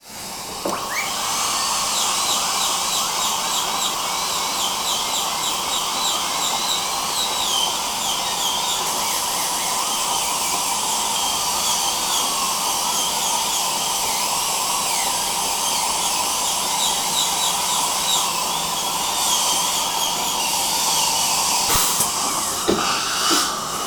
medical dentist drill and suction working on tooth